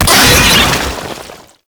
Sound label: Pain, Vocals; Enemies; Warframe; Proto Infested; Brachiolyst